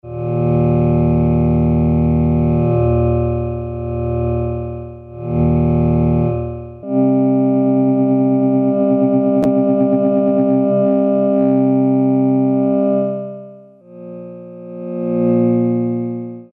Android Choir; Alesis airSynth

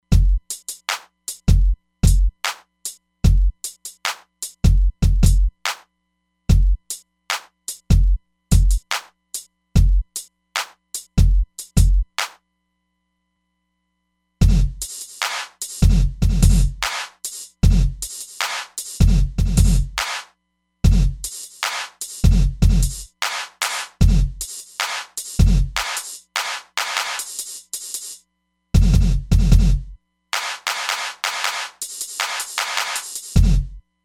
Pan Ricochet, Roland DEP-5; Factory Patches

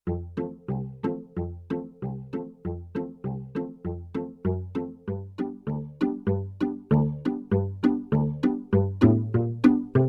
Pizz Chorus; Yamaha TX16W

